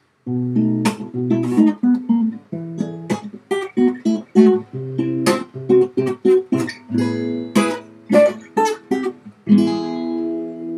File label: Birthday, licks, guitar